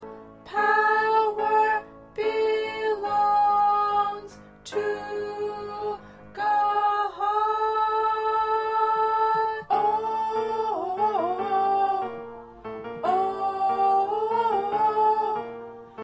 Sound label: 1st Alto